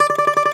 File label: DOMRA D, Prima Domra, Plucked Strings; E-MU Producer Series Vol. 7 – Old World Instruments